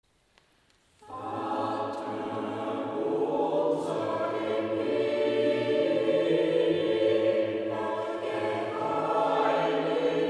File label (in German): Vater unser Chor; Chor a cappella